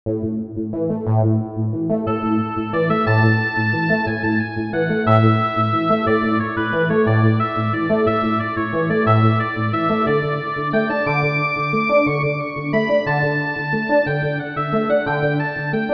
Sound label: Oboe Whistle Remix